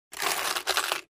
Stiring around in coin box

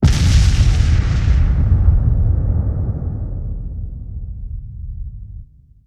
Massive area explosion

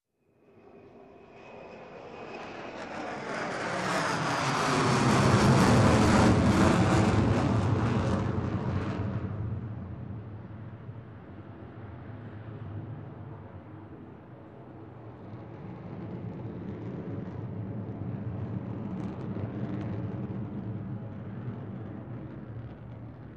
Fast aircraft passing by

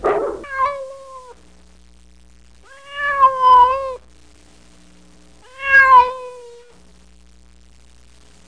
Cat meowing and single bark

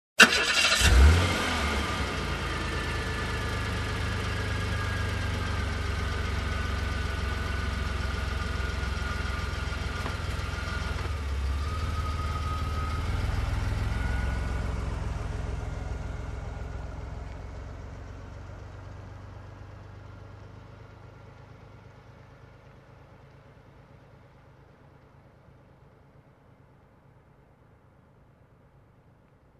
Car engine ignition and slow drive away

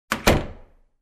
Office Door close

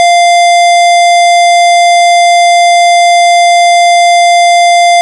Long Bright Beep

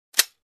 Gun trigger

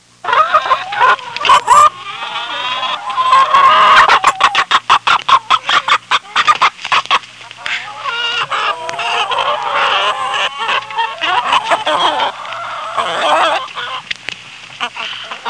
Farm chicken clucking

